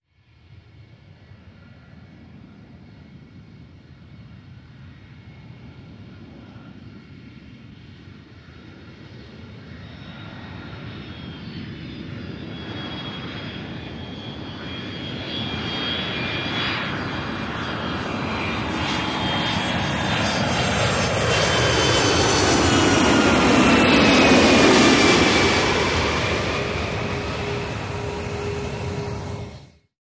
Jumbo jet idles as another flies over ( threshold of runway perspective